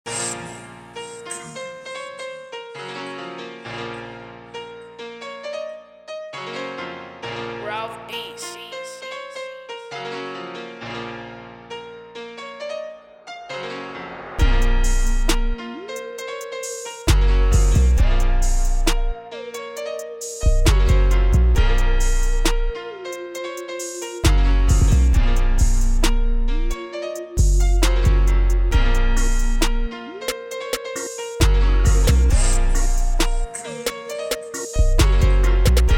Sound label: Instrumental